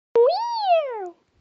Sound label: cat